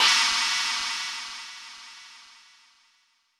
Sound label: Roland U220 Electric Set, Drum Machines